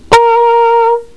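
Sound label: FLUGELHORN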